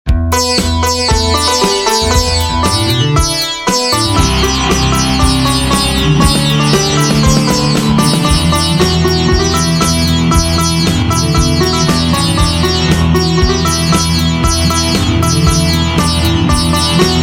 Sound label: Sitar